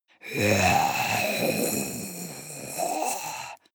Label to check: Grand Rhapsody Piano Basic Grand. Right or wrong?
Wrong